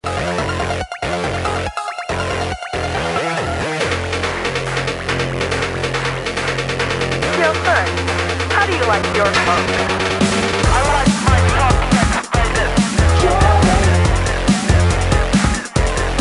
hardcore beats; pure filth; electrobreak

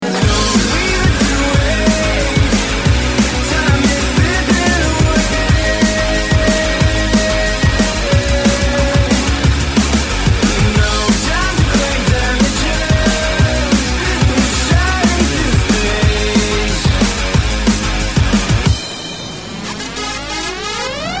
breakbeat; jungle

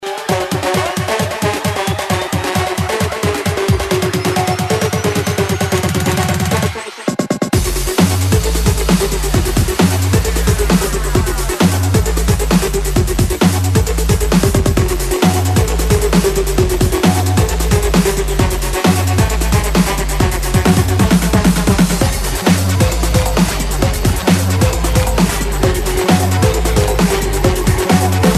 hardcore beats, electrobreak

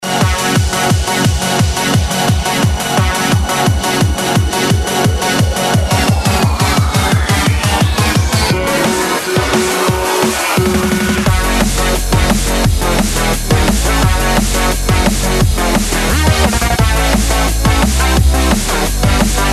breakbeat; jungle

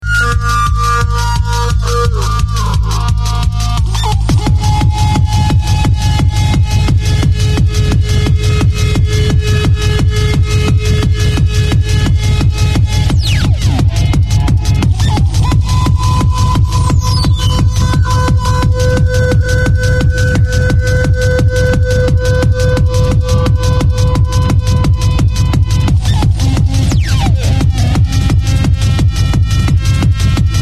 techno

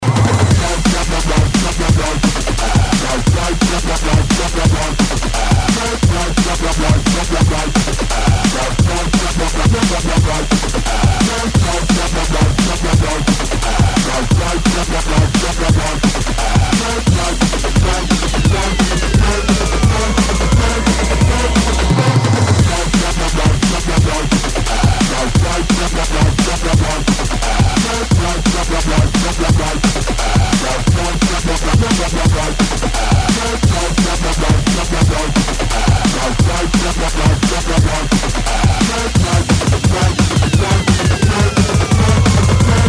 drumsound bassline, jungle

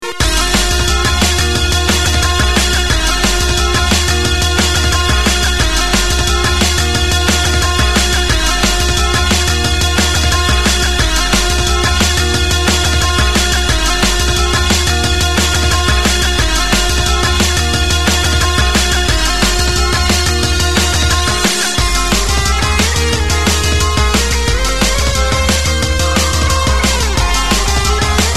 breakbeat; jungle

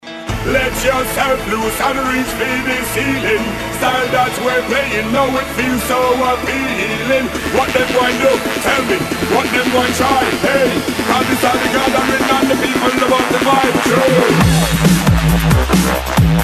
hardcore beats; electrobreak

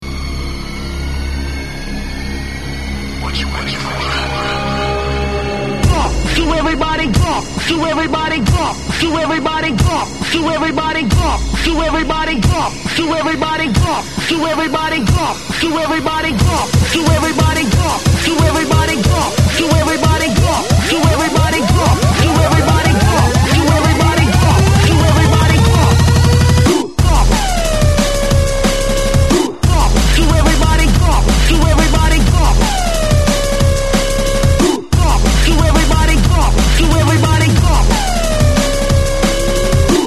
drumsound bassline, jungle

